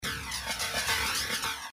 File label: drum jungle